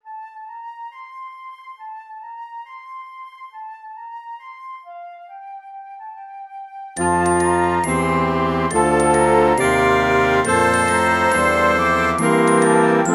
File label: National Anthem; Lavvakcesaan